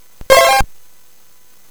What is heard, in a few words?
CSG8580R5, GAMES; Solitaire